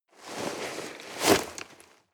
nylon leather hard short

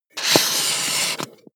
marker mark hard long

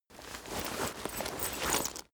leather metal handling short

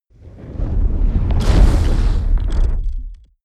fire whoosh experimental large

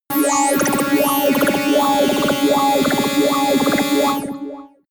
alien synth

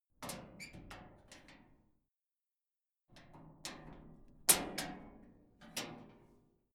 metal gate latch

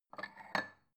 bowl take soft

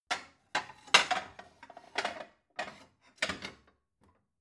plate multiple shelve soft

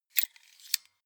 stapler close staple compartment

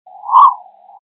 move strong highfrequent

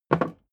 hammer wood put down

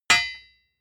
hammer hit steel

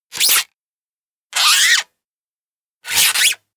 scratch

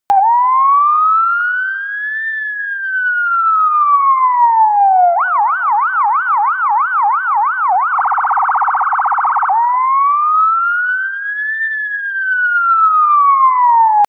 ambulance siren uk

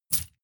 step armor chainmail

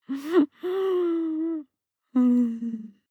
breath female whimper breath

